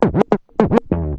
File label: TURNTABLES, Hip Hop